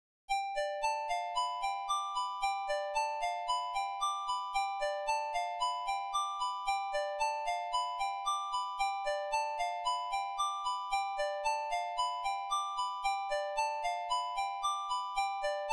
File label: melody